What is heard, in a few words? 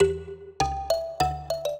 minuet, mbira; Mozart